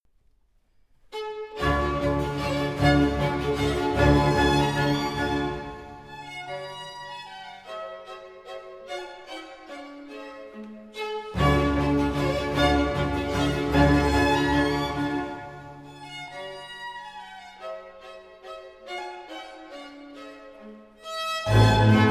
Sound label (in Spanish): Sinfonia en Re M. Menuetto-Trio; Pleyel